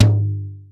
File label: KOMMERZDRUMS